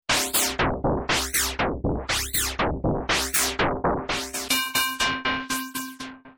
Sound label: FM One, FM synth; Creamware Pulsar 2